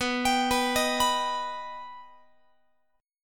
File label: B Augmented B down Guitar; arpeggiated; x,19,22,21,19,22